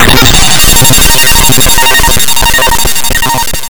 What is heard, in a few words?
Glitch Loud